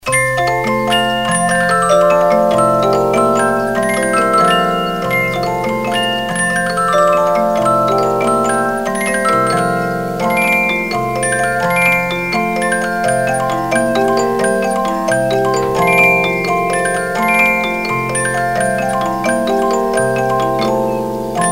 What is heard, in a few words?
Invitation to the Dance, 50 Note music box movement